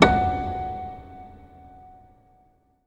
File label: PIANO F#5; Best Service; Advanced Media Trax Modern Composer; PNO HAMON